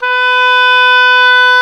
OBOE, Orchestral Winds, Oboe mf f; Roland LCDP04 Orchestral Winds; Oboe 2&3

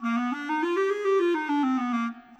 Clarinet; Sonic Foundry (Sony Creative Software); Orchestral VOL-2; Modern 06

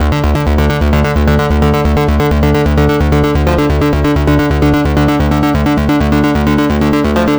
NRG Arp, trance

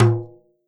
MIXED DRUMS, World Instruments

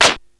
SHAKER, ELEC PRCS; East West; Global Sounds

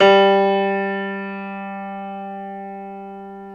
PNO Grand Pno; Club-50 - Foundations Roland

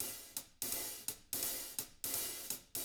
HIHAT LOOPS, Living Drums

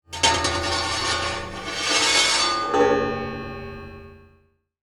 Bowed & Delayed, contact mic, Wire & Tin; musicradar; contact mic madness

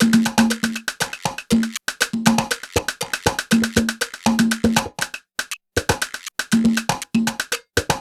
Complex, Percussion Loops

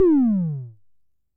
tom, Coron Drum-Synce DS-7

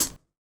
KIT, Dance Mega Drums, HIP; Best Service

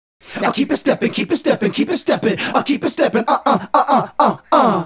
Male Group, Hip-Hop and RnB Vocals

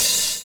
VINYL OH, Drums Dance & Groove; USB Soundscan vol.11; AKAI; VINYLKIT

